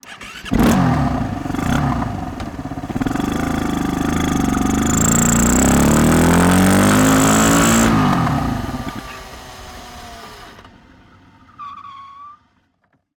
Beta 520 RR ONBOARD Driving Sequence Turn On Start Short Acceleration Then Turn, 4-Stroke 498cc; 2010; Engine Off Skidding; EXHAUST; Dramatic Cat